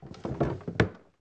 porta abrindo

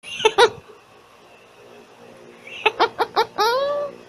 risadinha

